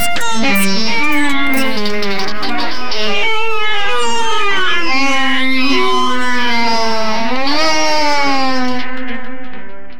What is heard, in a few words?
i want a very short, steampunk